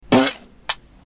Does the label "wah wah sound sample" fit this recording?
No